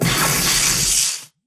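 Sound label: impact bullet energy titan shield arc; Titanfall 2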